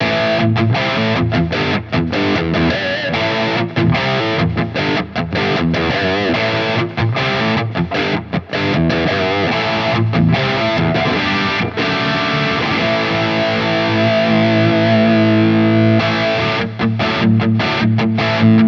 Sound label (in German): Floorboards im Vergleich Highgain Pure Amp Fender Tone Master Pro